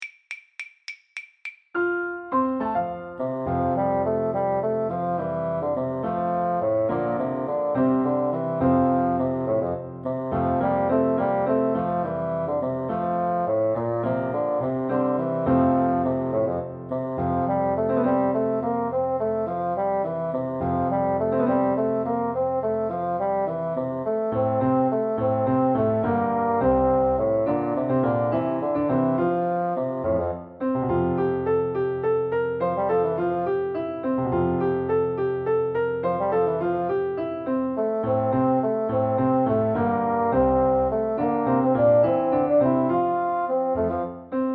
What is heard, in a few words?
the maiden blush piano basson